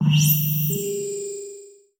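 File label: electronic sounds of data transmission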